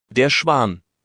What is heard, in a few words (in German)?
Schwan, wildvogel